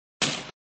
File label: Sound Tone, flipper; Tone v4